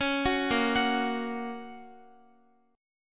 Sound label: Bb Minor Sharp 5th, Guitar; arpeggiated; x,x,11,11,10,13; 4E,4A,5D,5G,5C,6F